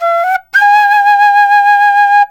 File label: SERULING, Heart of Asia